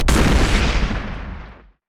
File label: sonic boom; Cyber Ezreal